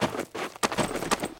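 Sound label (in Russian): быстрая распаковка плащ палатки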